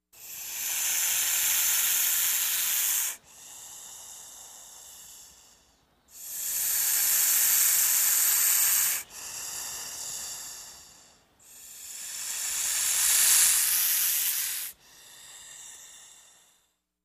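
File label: Snake Triple Hiss In Out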